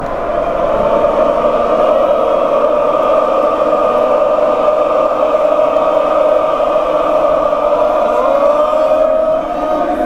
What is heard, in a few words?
panathinaikos chant